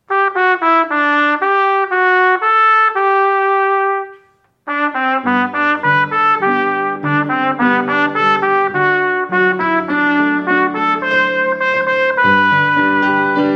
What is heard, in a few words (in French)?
trompette; recueil Louez Dieu